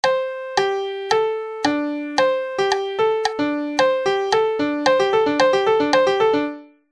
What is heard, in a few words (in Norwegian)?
Udelt takt, Vla